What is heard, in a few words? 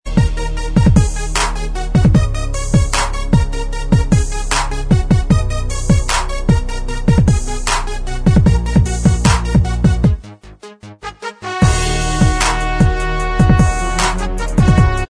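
Inst Track, instrumental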